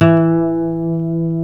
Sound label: E 3 nylon, Guitars